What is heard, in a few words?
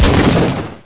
explode